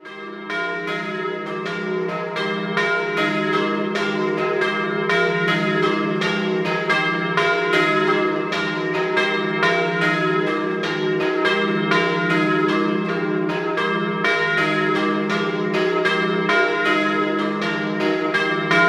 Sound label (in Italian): Doppio, campane; Variano